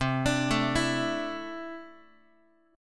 C 6th Add 9th C down Guitar; arpeggiated; JGuitar

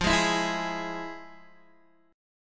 F Minor Major 7th, Guitar DADGAD, chord

